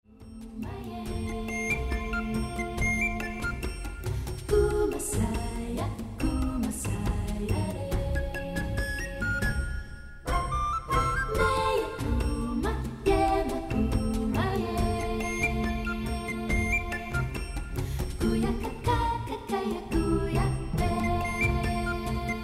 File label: Rumba